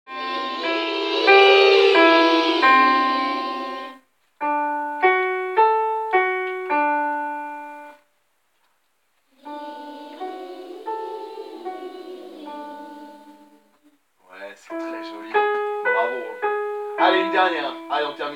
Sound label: Vocal